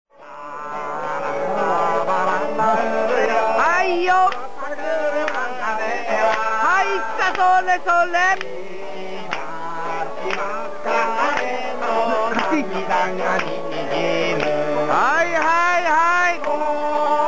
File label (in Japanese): camp utagoe; 深夜の谷に響き渡る怒涛の歌声; 「何でこの場所にてこの曲が？いやいやこのミスマッチがスバラシイ！」